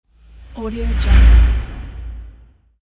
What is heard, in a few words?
air swish; music-fish